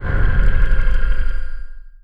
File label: titan laser fire; weapons; Stellaris